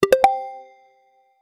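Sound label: bounce sound mobile; clear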